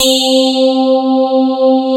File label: sparkles